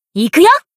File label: Battle Shout; Rumi